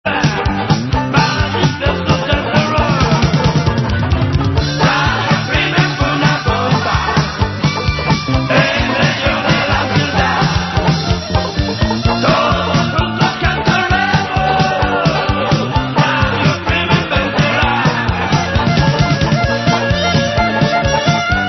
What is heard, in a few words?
BEAT